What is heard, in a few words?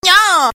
Nyoo